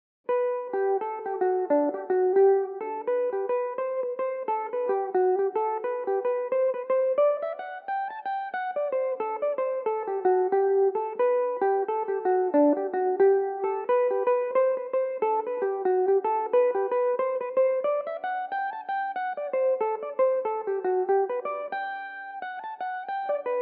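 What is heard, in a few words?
jig G major belmuse, irish mandolin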